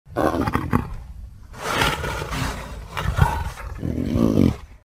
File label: lion; animals